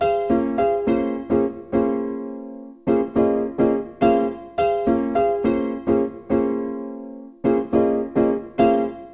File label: sevenths piano chording; 105 Dibble Dobble